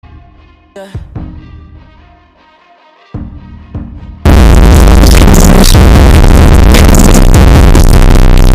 Sad earrape

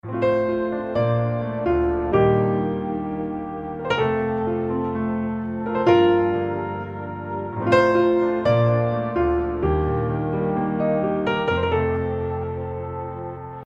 Simple Piano

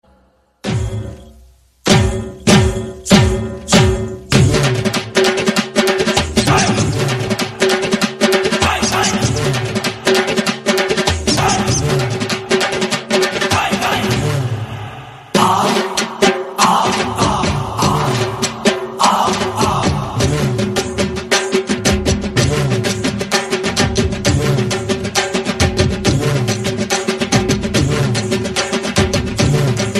South Indian drum

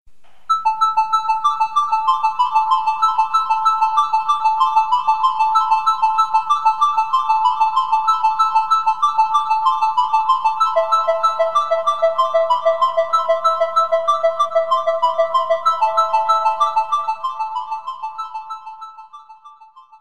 Ring Tone; Babel

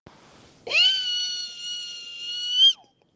Sqweeee

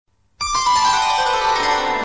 Sweet Alert

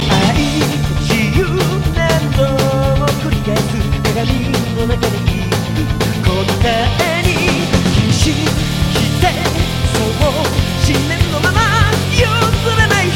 Vocals

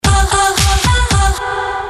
T-mobile Sms

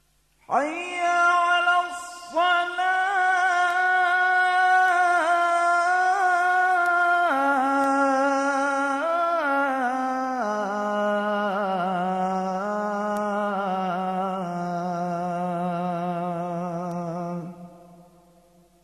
azan jawa

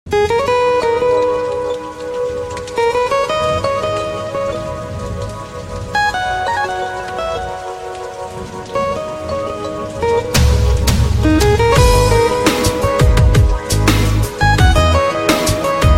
Persian Guitar

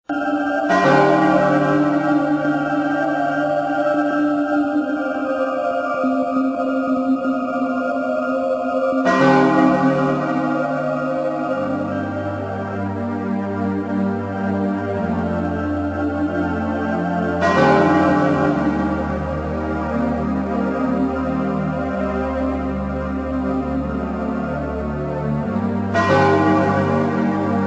Bells; Loz Final Hrs

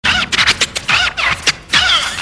Stereo Scratch

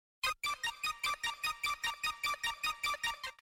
unique sms

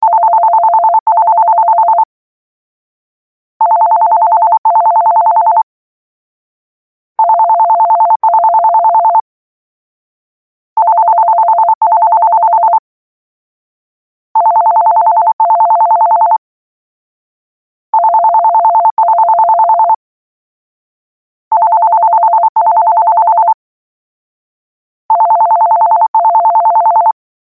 Landline Phone Ring

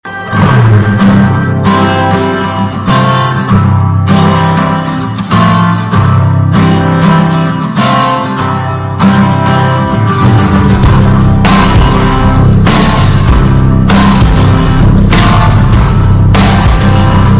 Bass Guitar; 3 Idiots

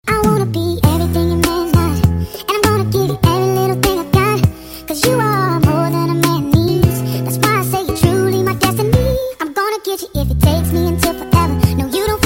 Cutest Ringtone

